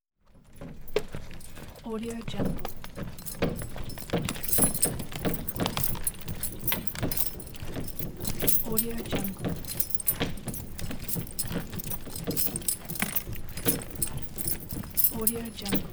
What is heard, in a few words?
stagehand rolling acoustical sound shell; applehillstudios